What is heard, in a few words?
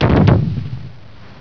FIRE